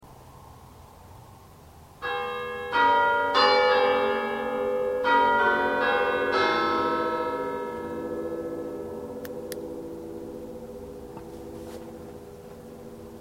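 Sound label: half hour, chimes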